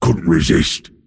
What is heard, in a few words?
deny pain; pudge